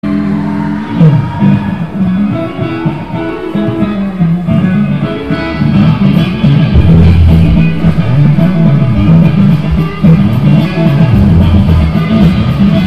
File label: riff